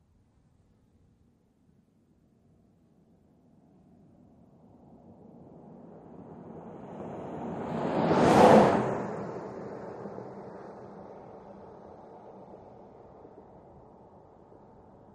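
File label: SUV By From R To L 55 mph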